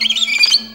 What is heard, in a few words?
VIOLIN BIRDS; Best Service; World Colours